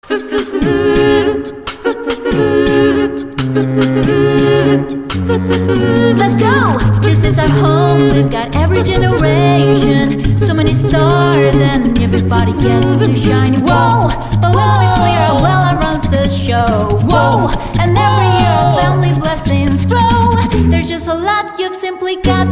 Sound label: Encanto OST Acapella